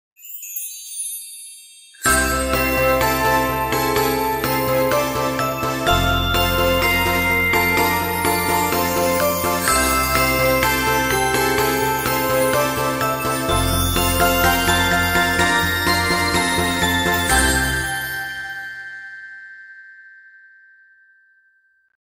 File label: christmas song ringtone